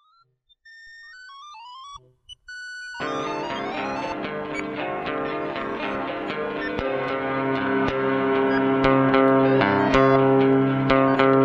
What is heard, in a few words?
Delay Siren